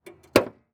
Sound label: Truck Ford Ranger Open Hood; transportation